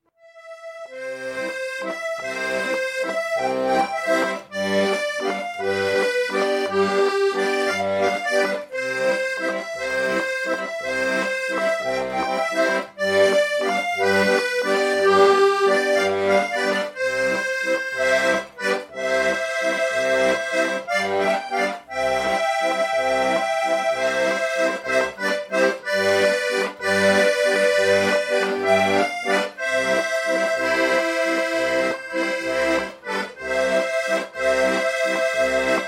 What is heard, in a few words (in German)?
Kuckkuckswalzer